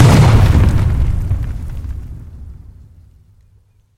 vzriv s ognennim plamenem

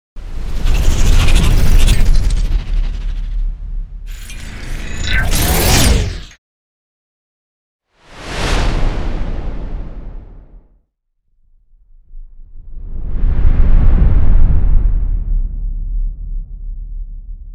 nabor kino- i televizionnih nauchno-fantasticheskih effektov gula effektov perehoda

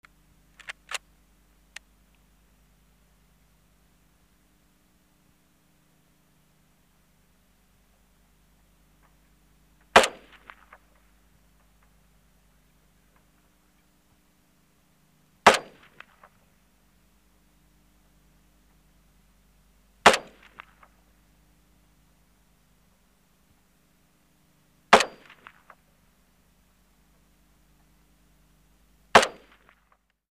avtomaticheskoe ruzhyo zaryadka oruzhiya i pyat vistrelov

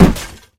korotkij silnij nervnij udar po stolu kulakom

